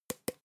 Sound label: season short; berufe; gastronomie